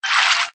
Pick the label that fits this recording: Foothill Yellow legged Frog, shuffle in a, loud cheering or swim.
swim